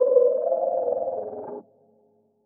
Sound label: chirp, protozoan; hl1 npc